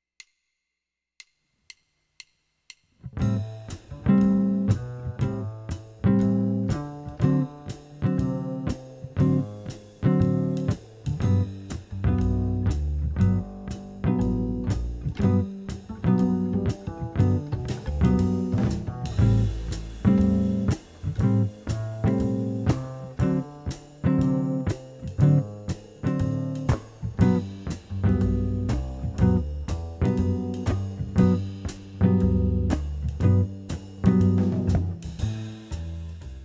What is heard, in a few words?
jazz guitar chords backing track